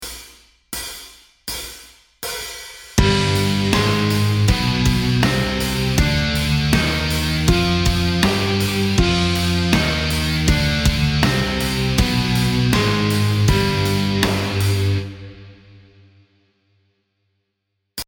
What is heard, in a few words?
Exotic Modes G Phrygian Dominant Superphrygian Relative Eb Major; metal music theory